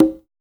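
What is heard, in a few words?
jungle conga; Drums In Your FACE!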